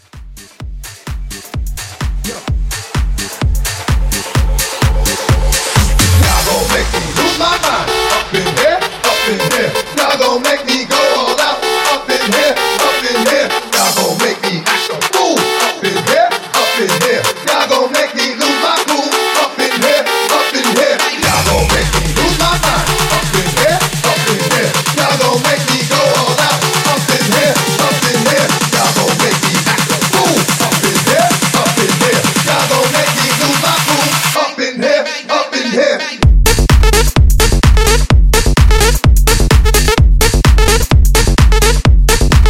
Edit 128

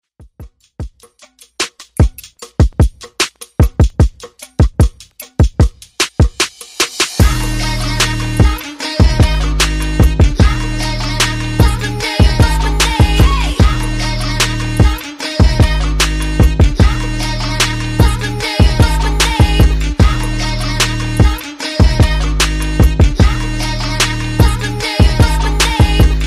Top40, 75 Bpm

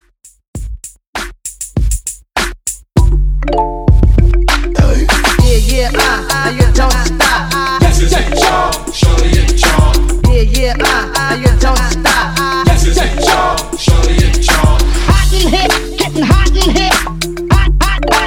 HipHop 99 BPM

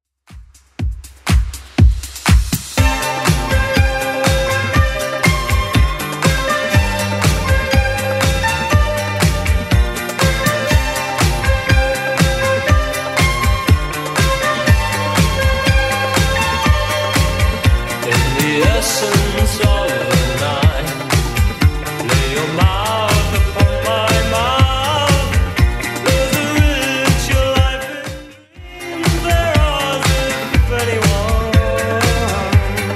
80s Club 121 bpm

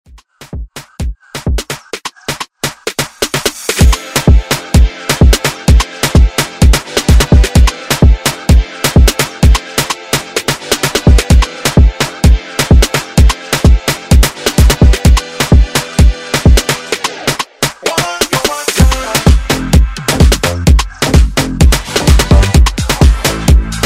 Afrobeat Redrum

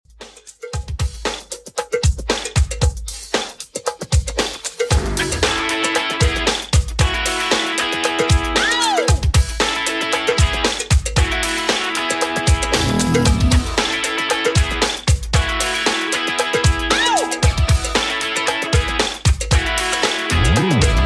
90s 115bpm

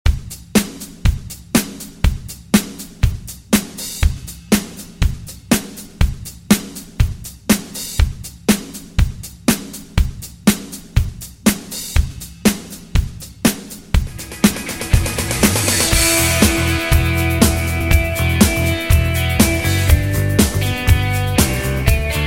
Funk 80s Redrum 121Bpm

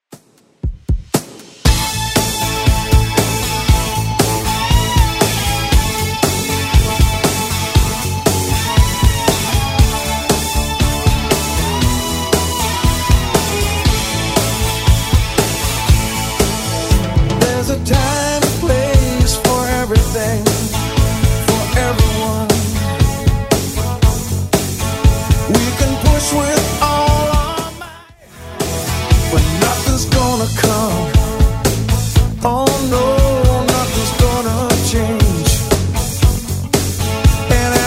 80s Rock 118bpm; Redrum

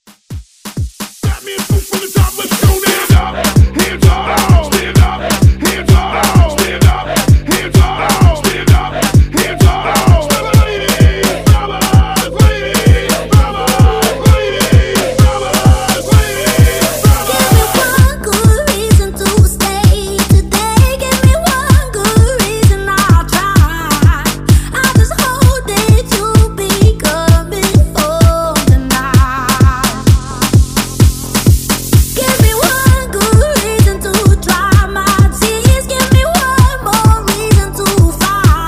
Hype Redrum

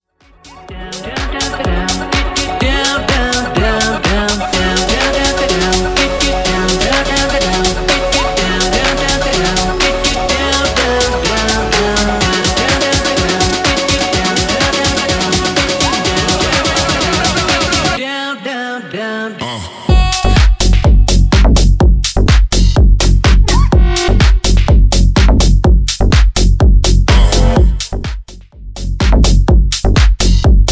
Mashup 125bpm; Bootleg

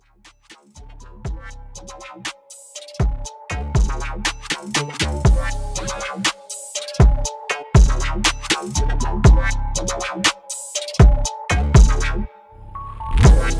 Dubstep 120 BPM

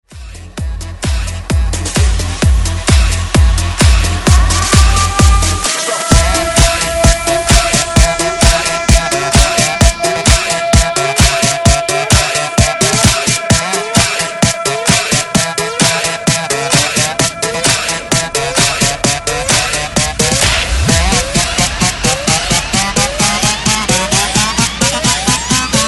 130Bpm BREAKMIX; DJDOLLS

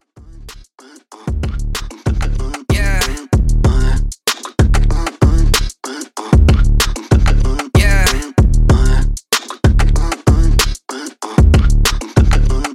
Beatbox Pop Beat Bass 95Bpm Clean

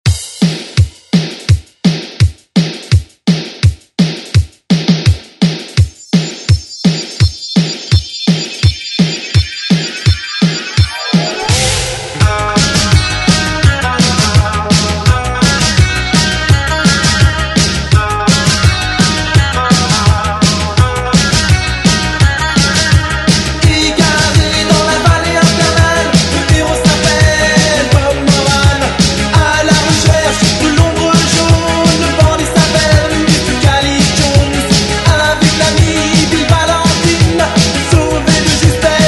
80s redrum; DJ Mhark

